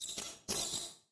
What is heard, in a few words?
Creature Sounds Spider; Spider 2; raycaster